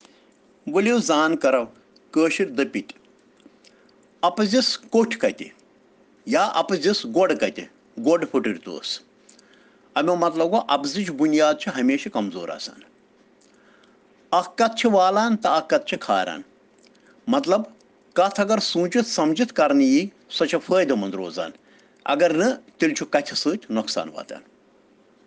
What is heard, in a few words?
Children Rhyms in Kashmiri